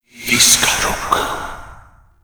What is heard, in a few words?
Alt Fire Whispers; Weapons